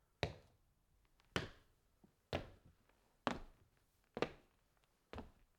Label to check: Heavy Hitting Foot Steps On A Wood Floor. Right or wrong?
Right